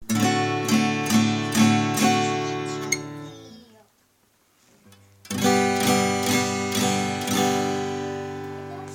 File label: d7 to g slide finger practice; guitar killing project